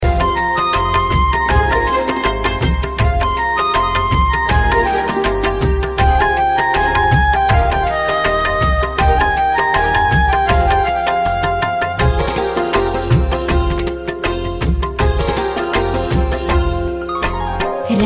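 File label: background music ringtone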